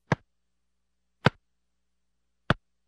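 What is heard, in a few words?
3 kicks of a ball; Звуки футбола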